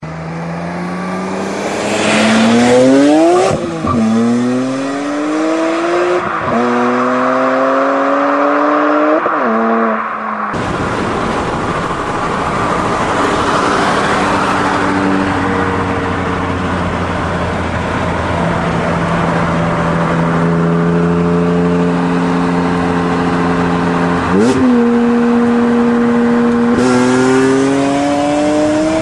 BMW M3

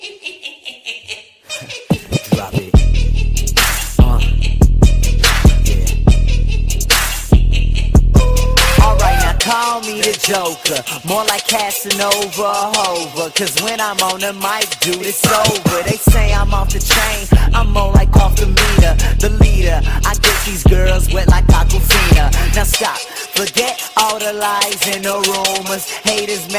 Trap; Joker

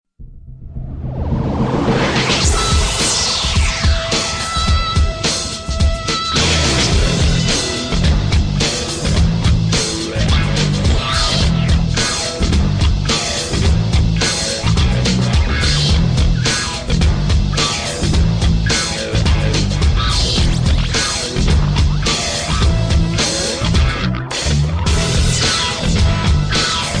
NEW AGE TONE

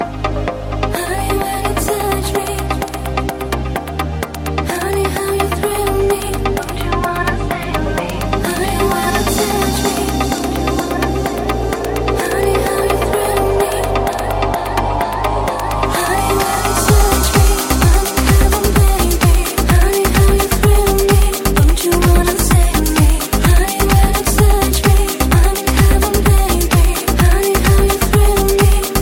house club

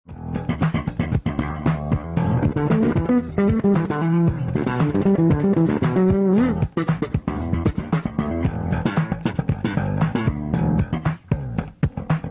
classic bass solo

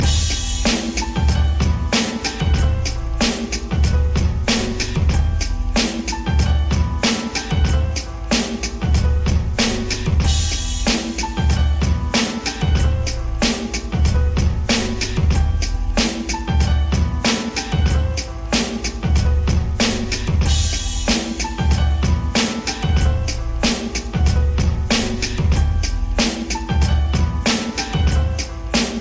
black beat, hip hop

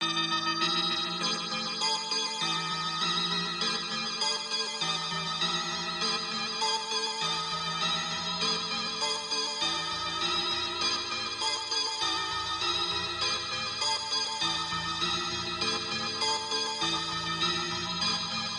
Find The Bell

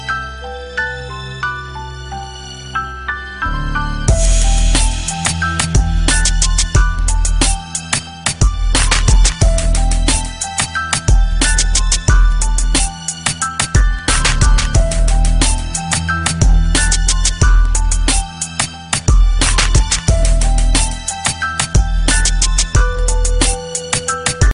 Music Tone

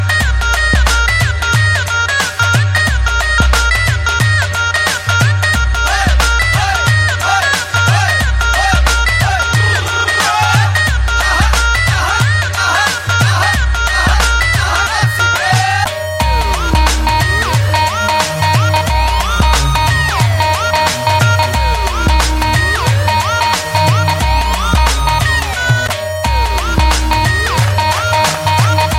punjabi tune

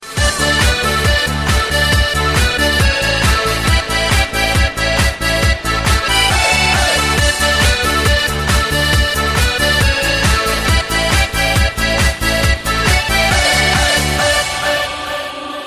polka

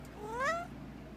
Meow; Chat Roux

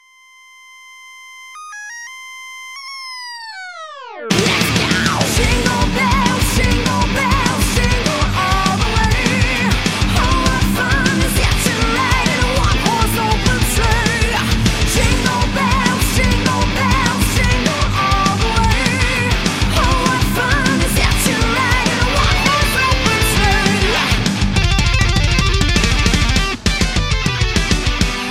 christmas jinglebell; ringtones

